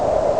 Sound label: wind; Amiga Soundtracker Sample Packs